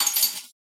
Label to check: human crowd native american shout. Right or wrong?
Wrong